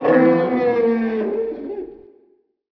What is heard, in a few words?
die; unreal; titan